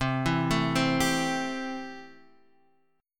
C 6th, C, down, Guitar, Standard; C6 arpeggiated

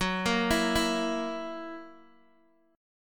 F sharp 7th, Guitar, DADGAD

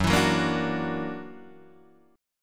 Gb Augmented 7th Gb down Guitar Open D

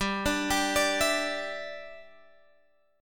G 5th Add 13th G Guitar Standard, chord

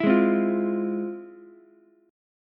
F Minor Major 7th, Ukulele, chord; FmM7 strummed; JGuitar

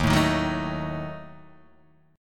D sharp Diminished D sharp, Guitar, Open D; chordshape; 1,0,1,0,0,1

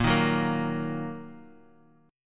Bb Suspended 4th Sharp 5th down, Bbsus4#5 chord; Bbsus4#5 strummed; JGuitar

